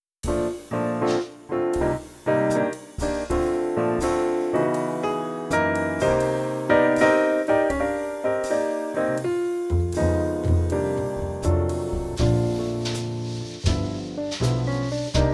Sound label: minus sax